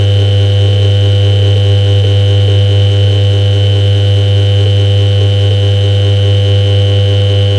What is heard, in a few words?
Motor Hum